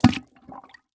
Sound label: Impact Plunger Plunging Into Water Gurgling; Impacts